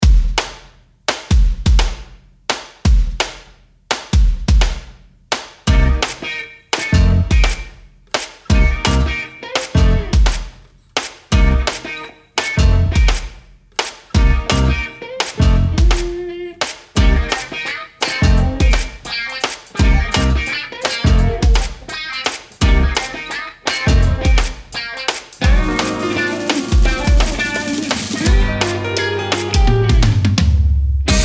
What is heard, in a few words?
Modern Pop Production; This Is Gold: Modern Pop Production